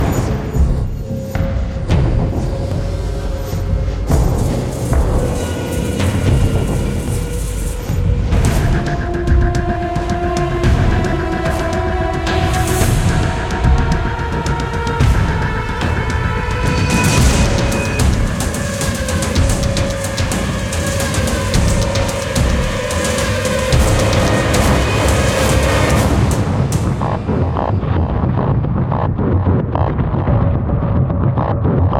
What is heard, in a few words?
Combat Tactical